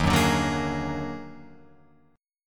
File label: D sharp Minor Major 11th D sharp, Guitar Open F; strummed